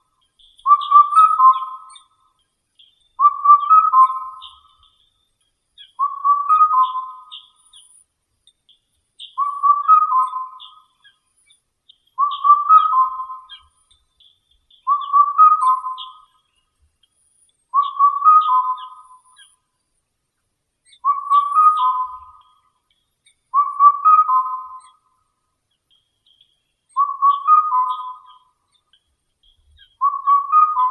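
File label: indian cuckoo, cuculus micropterus; kukushka indiiskaya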